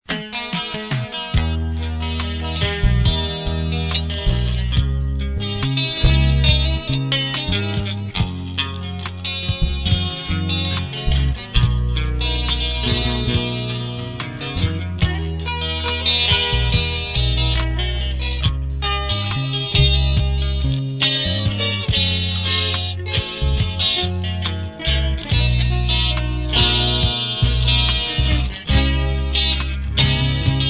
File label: music